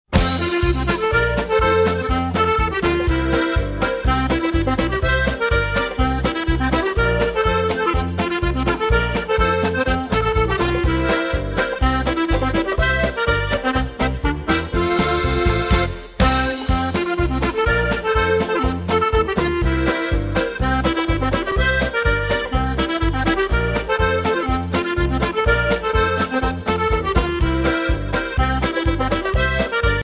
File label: Button Box Polka